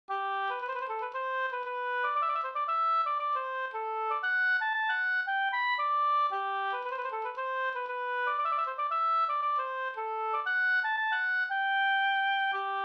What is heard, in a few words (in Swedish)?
hamburska